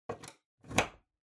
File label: Wall Outlet Wall Plug In